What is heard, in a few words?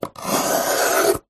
office compass pencil draw circle on paper